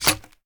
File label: reload empty shells in, sh; gmod; wfoly plr sh charlie725